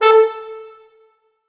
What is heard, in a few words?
Orchestral Brass